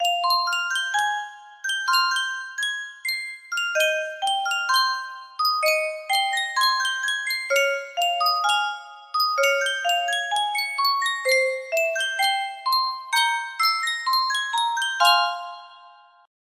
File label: yunsheng music box, music box melody